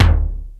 ROCK PERC.

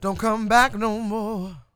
SING W, Vocal Planet Blues & Soul, BLUESY; ILIO

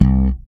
slap bass

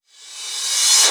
Reverse Cymbals

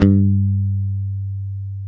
G 3, go-go mute, bass; Spectrasonics; Bass Legends CD 1

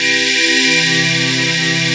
HOUCEY CHORD

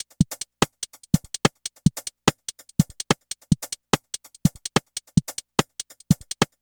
Funky Cut Beat, Drums & Percussion, Electronic; Sonic Foundry (Sony Creative Software) - Electronic Point Blank

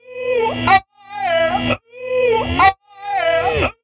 oola, 125bpm; transx loops

